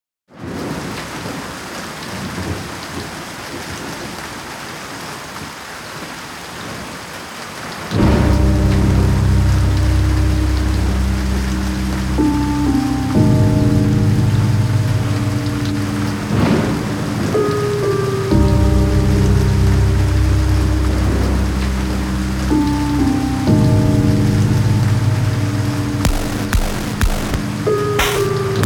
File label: Pensive Rain